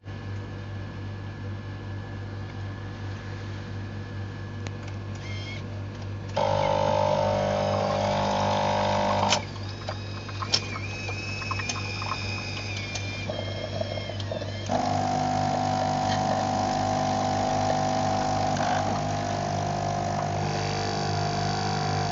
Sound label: Espresso Machine Turn On